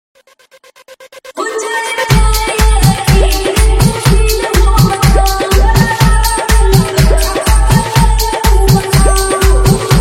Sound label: Dj Remix Hard Bass High Vibration